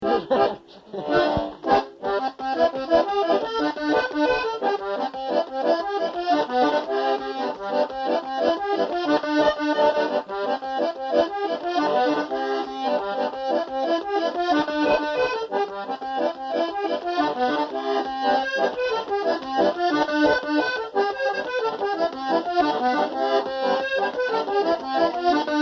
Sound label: Accordion tune